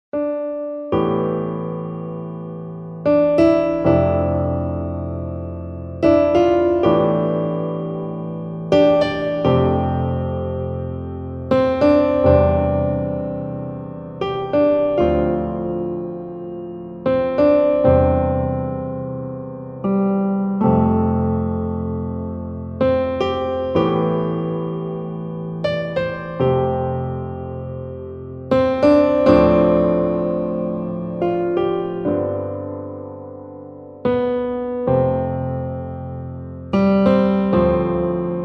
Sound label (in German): Ausklang Piano